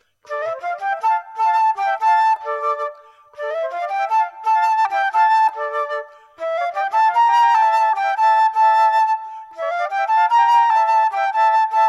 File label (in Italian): Duetto